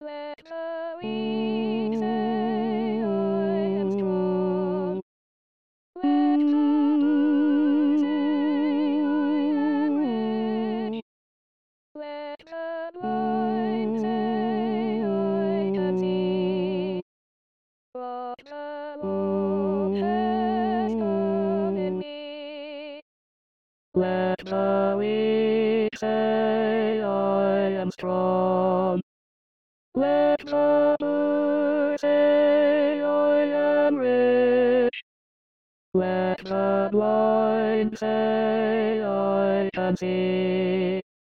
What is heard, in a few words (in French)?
Hosanna Tutti, choristes